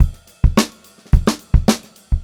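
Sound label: 106 groovy variation 2, funk groove drumloops; USB Soundscan vol.38; AKAI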